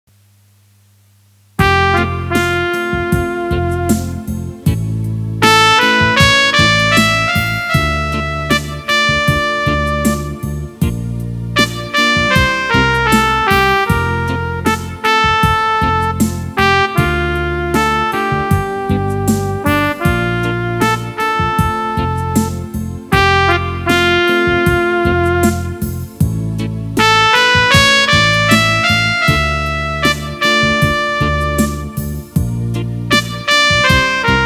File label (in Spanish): Piano teclado